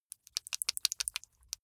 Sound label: flesh wet fast squish